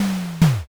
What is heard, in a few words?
door close